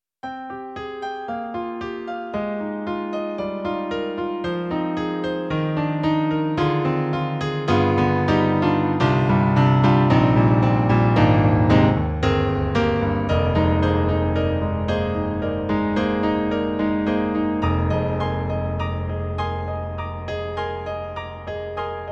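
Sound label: Roland SonicCell Bright Tune, piano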